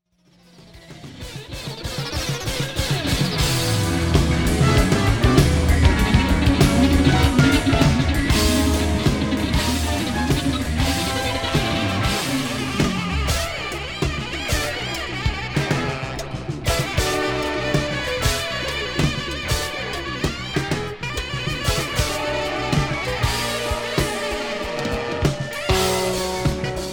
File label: bass